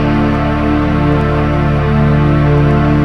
Pesh Pad - 7A; Jungle; Pads; Blu Mar Ten; Jungle - 1989 to 1999

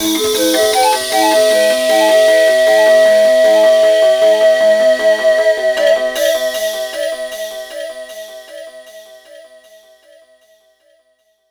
Wash Flute; Jungle; Blu Mar Ten - Jungle Jungle - 1989 to 1999 Samplepack

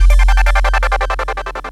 Gate Riff; Jungle; Riffs, Arps & Hits; Blu Mar Ten; Jungle - 1989 to 1999

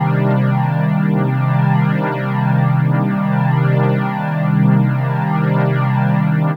Sonic Pad - 5A; Jungle; Pads; Blu Mar Ten; Jungle - 1989 to 1999

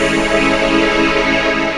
True Pad; Jungle; Pads; Blu Mar Ten; Jungle - 1989 to 1999

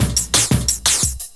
Rumble Break; Jungle; Breaks; Blu Mar Ten - Jungle Jungle - 1989 to 1999 Samplepack

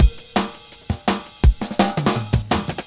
Sunset Break, Breaks, Jungle; Blu Mar Ten - Jungle Jungle - 1989 to 1999 Samplepack

